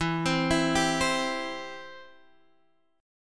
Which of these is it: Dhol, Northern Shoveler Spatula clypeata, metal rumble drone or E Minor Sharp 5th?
E Minor Sharp 5th